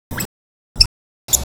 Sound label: pip